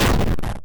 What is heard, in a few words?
explode; horizontalrunner